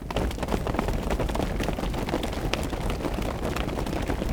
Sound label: HUM RUNIN, Foot & Mouth; Stompin'Feet; Roland LCDP14 Africa VOL-2